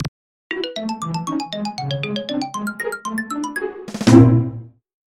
Startup Jingle; Gamecube

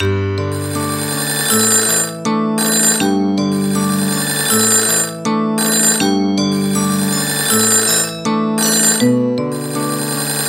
airy polyphonic

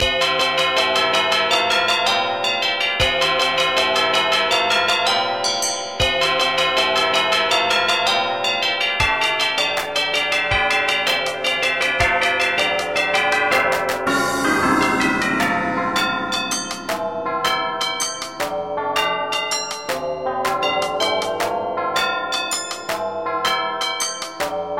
Ice Bells; SMB3

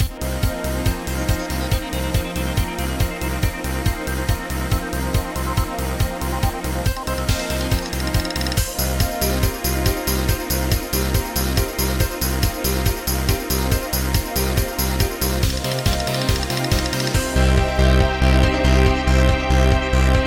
Trance

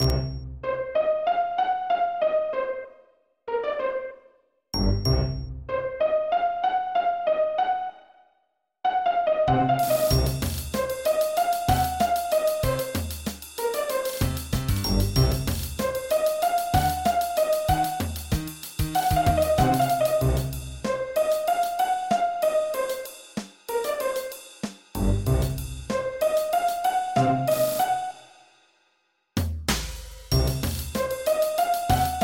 Trap Remix; Among Us

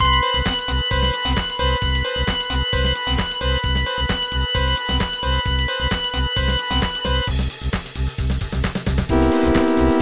Techno

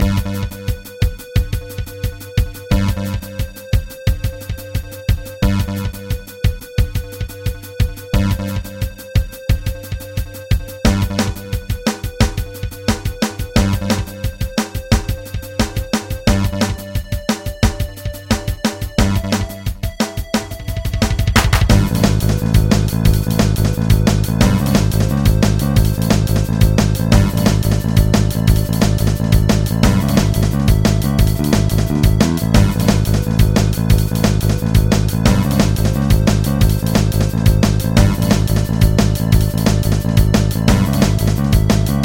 drum and bass

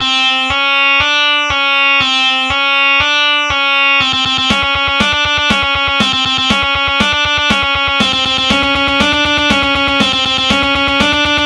Continue; Legacy Battlers 2

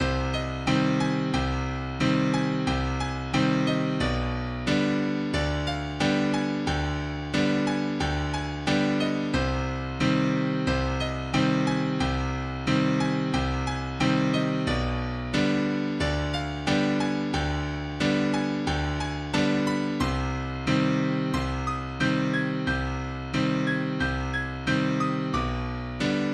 Piano Solo 19 Op66

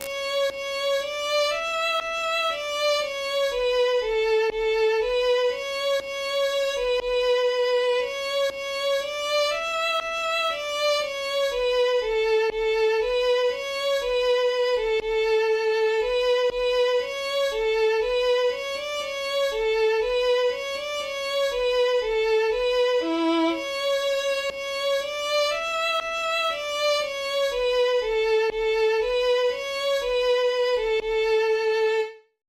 Violin

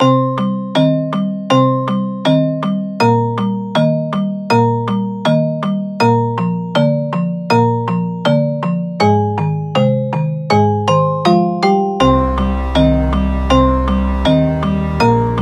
Generic Orchestral Song

